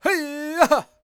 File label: POWWOW, LAKOTA, World Voices; ILIO; Vocal Planet VOL-5